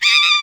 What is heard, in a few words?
Cartoon creature pain scream; khi